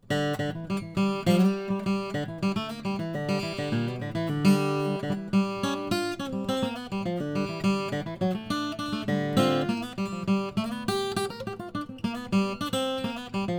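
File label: Auditorium OM Mahogany, guitar; Chris Bozung; Fleur-de-Lis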